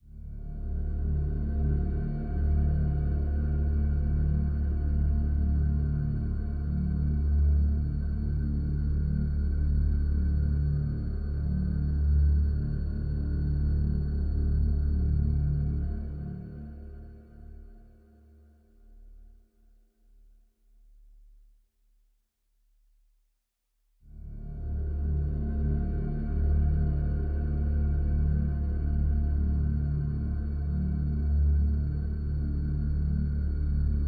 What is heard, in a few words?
Citadel Music Main Pad